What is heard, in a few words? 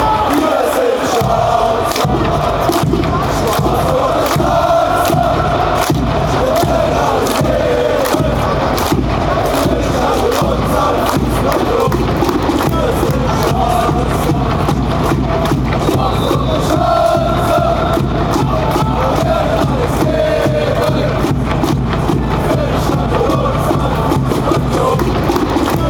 hymn fan chants; schanzer